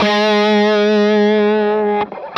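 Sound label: fuzz guitar vibrato; MAYFUZZ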